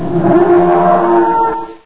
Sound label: pain; Source engine